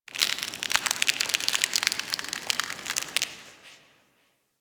Ice Creak Echo

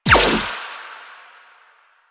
Choir Erupt Firing Eye; Warframe

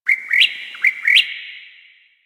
Bird Close; Ambience; One Offs; Birds; Warframe; Quests; The New War; Drifter Camp

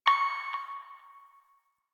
Hack Minigame Touch Node

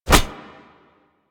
Hoverboard Grab; Warframe; Vehicles; Venus; anim sounds

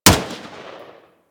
M16 Fire, rifle; Wraith

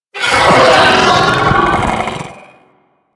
Sentient Vocal Attack, Enemies; Warframe; DE; Sentients; Ortholyst

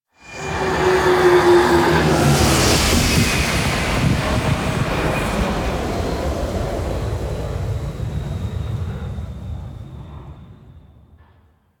Drop Off, Vehicles; Zariman Liset; Flight

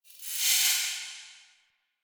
Transmission Open; Warframe; Gas City Ropa Fight; Natah

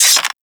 Generic Bow Load Arrow; Foley

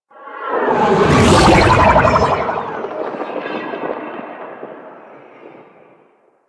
Insect Liset Fly By Slow, Vehicles Flight

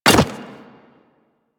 Hyena Micro Step Default, Footsteps; Warframe

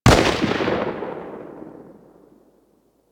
Weapon Enemy Tail Outdoor; Warframe; Weapons

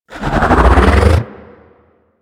Bow Draw; Stalker Bow

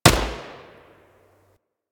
Shot; Warframe; Eidolon; EidolonJetpackMarine

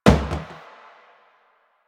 Plastic Hit Short; Warframe; Ambience; Nineteen Ninety Nine

